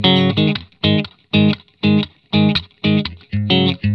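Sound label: 120, Vintage Blues Guitar rhythm; USB Soundscan vol.22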